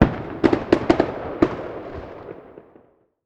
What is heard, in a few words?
Going down sounds; Blastmorph Pinball; xbox series xs